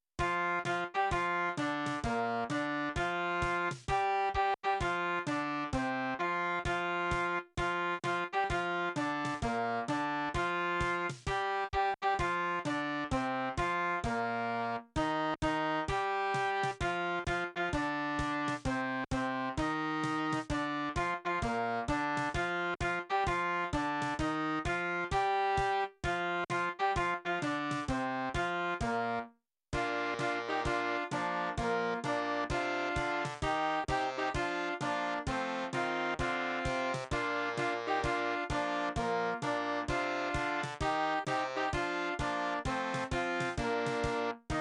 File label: Full Band